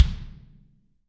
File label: BD, Real Mega Drums